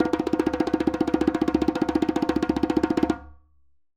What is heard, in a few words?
djembe Roll; Raw Perc One Shots; Slate; SD SEISMIC Drum Sample Pack